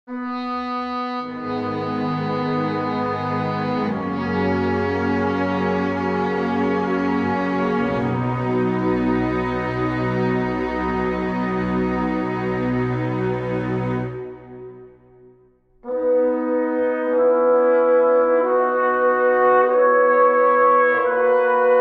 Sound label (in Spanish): orquestal emocional